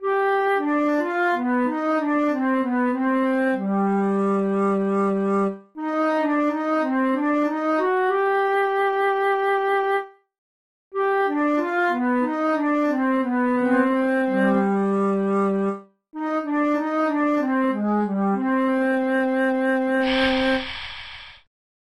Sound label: Wind Melody